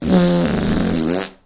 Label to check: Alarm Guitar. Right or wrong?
Wrong